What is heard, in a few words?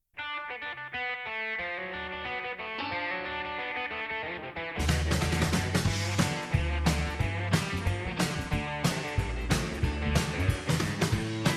12 Bar Blues D; Learn the pattern of the 12-Bar Blues